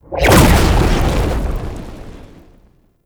summon cast impact; Roathe; Warframe; Tau; Abilities

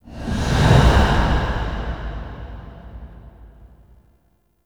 Whoosh; Entrati Labs Dark Refactory

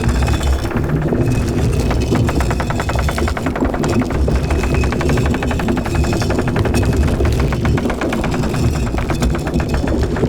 Wind Mill Stone Gear Loop

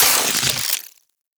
Reload; Warframe; Weapons; Tenno; Wraith Sidearm; Epitaph Prime

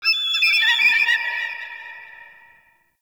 Corrupted Beach Seagull Night; Ambience

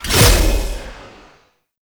Impale Cast Release; Warframe; Hoplite

